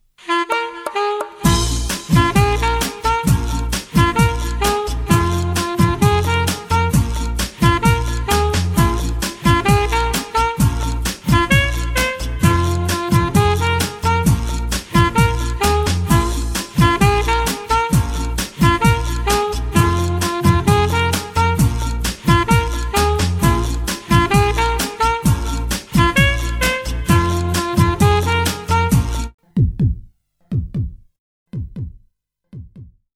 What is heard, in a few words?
Melody, RingTune